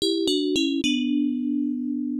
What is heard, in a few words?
SE Confession Failure; doukyusei pc 9801